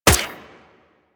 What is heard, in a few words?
Kinetic Plating Shield Hit; Warframe; Runner